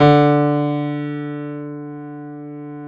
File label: PIA PRO, Roland